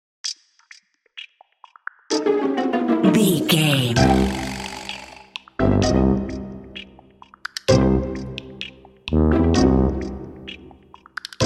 silly fun narrative

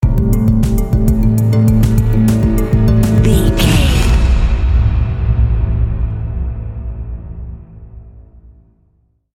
approaching storm sting

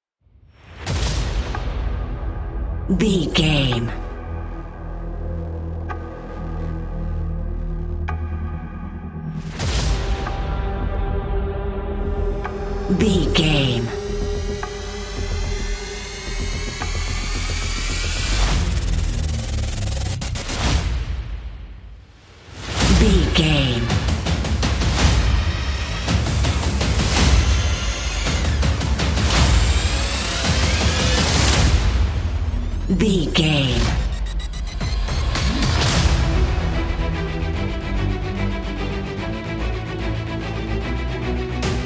hybrid epic trailer

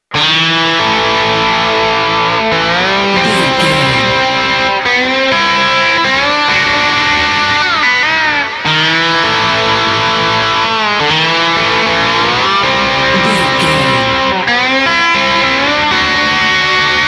wrong turn trouble

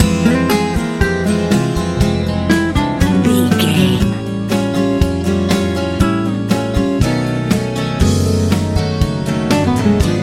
hot happy easy pop

